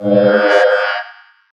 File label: gurp; needleman